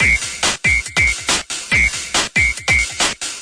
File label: 140 whistle distorted loop